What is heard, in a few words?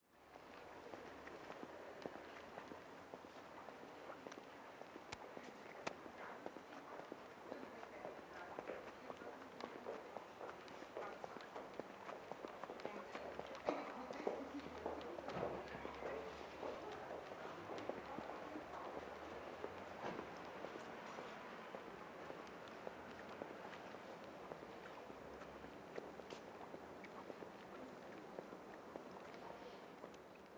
Ambience, Salzburg Bells